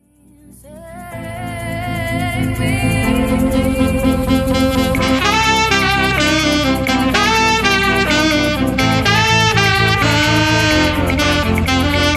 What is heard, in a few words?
Sax